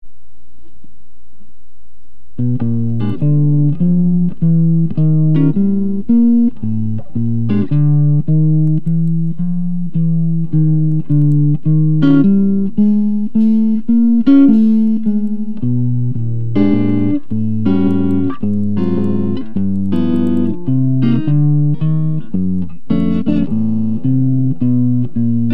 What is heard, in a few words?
jazz